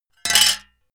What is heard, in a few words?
metal plate thin drop